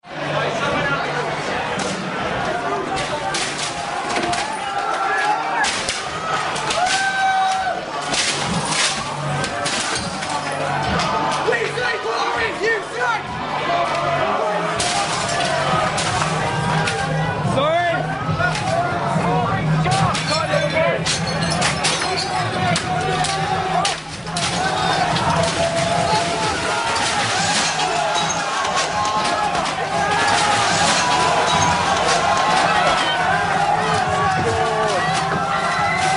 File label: london student demo inside millbank